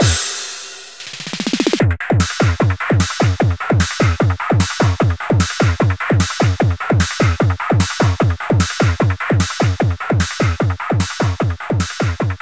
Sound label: Escape Sequence; Spotted